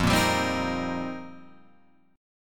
F 7th Suspended 2nd Suspended 4th, Guitar Open F, F7sus2sus4 chord; strummed; JGuitar